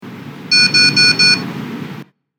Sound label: angry, beeps; labpro